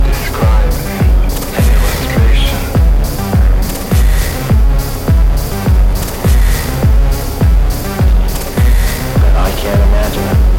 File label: Piano